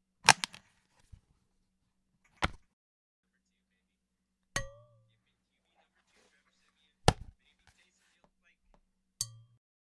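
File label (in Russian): эти перкуссии производятся объектами используемыми в доме и офисе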